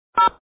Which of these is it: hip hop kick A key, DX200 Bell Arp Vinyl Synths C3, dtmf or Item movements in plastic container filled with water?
dtmf